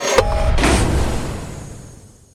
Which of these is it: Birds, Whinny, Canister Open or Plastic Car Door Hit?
Canister Open